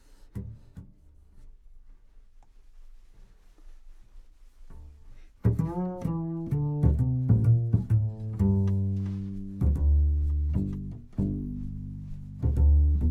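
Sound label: Contrabass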